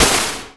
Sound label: Noise Hit; Drums & Drones